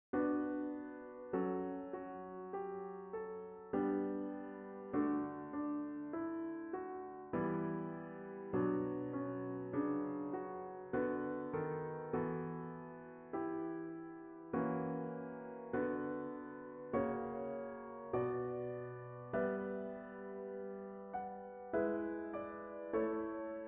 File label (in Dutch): tentamen harmonisch, solfege